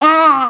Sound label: goblin die, combat